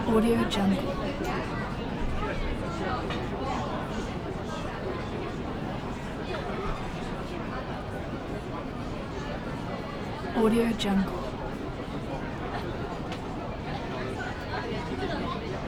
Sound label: city plaza crowded outdoor restaurant ambience